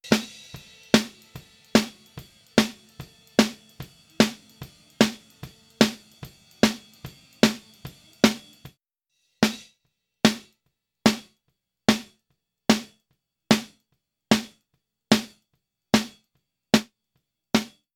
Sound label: gate snare